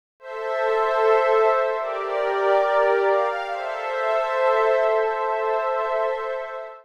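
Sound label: synth electro 140 A; Techno; Keys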